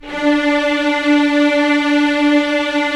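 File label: string section; Roland - LA Composer vol. 1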